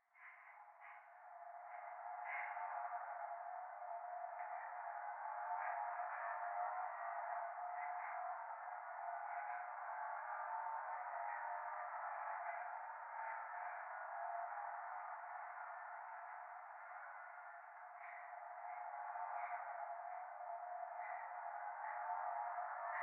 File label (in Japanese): 教会 左耳快感吐息 車の中